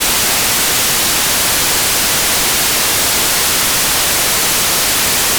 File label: rain